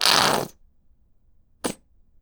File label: Plop Sputter, Bottle Plops